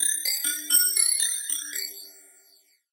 Death Tingle; smite; god cupid skin13

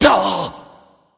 surprise; cuchulainn

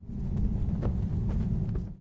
angelic defeat wind; smite; thanatos

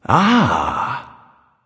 "ah", discover; smite; voicepack; ullr

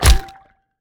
fist hit; smite; god ganesha skin11

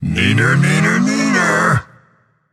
nv tongue; smite; hachiman

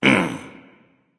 lift; chiron

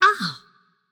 nv ouch; smite; voicepack; morgan le fay

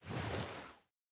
armor; smite; baronsamedi skin7

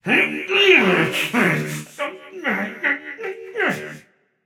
grumble, voicepack; smite; jorm

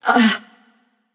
surprised passion; chaac

